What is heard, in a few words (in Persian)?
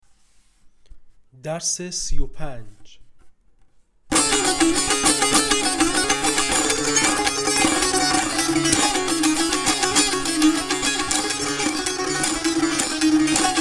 مشق تنبور